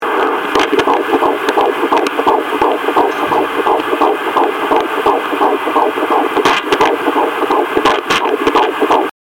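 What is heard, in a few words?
heartbeat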